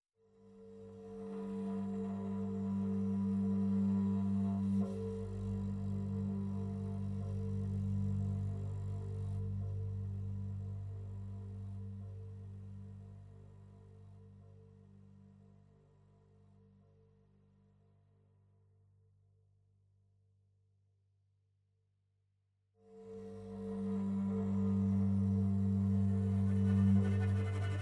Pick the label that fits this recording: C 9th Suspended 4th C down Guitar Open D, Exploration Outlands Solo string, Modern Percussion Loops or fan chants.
Exploration Outlands Solo string